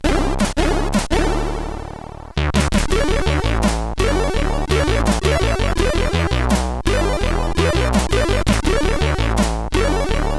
Metaljass, sid CSG8580R5

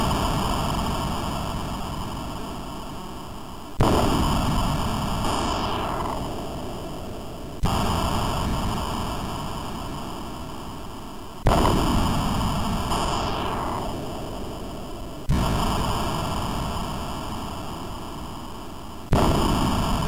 Short intro tune, MOS6581; hvsc

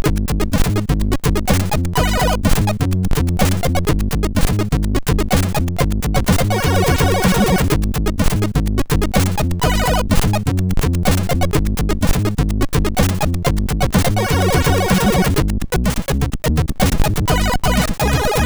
Funky MOS6581R4

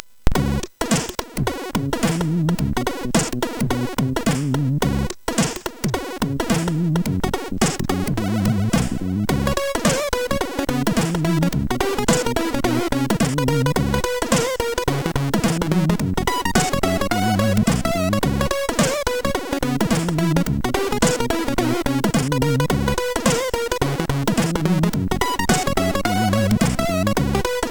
JAZZZZZ, MOS6581R4; hvsc

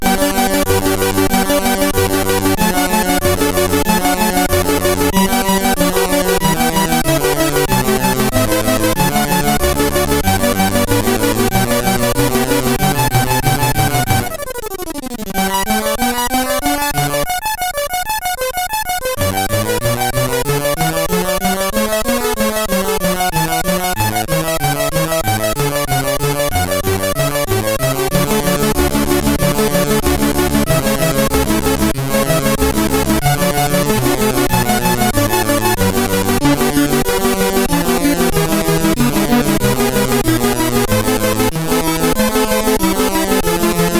Concerto G-Dur, sid CSG8580

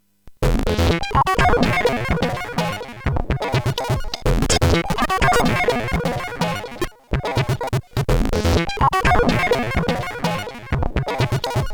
sid CSG8580; hvsc

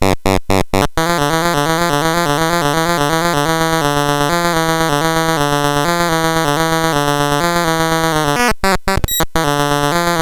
Car Chase, sid; 007

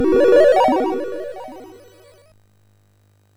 SFX, MOS6581; Narco Police

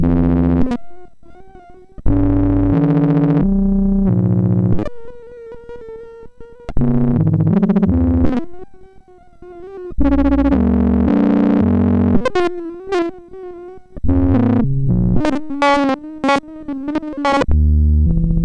Organ Torture, MOS6581

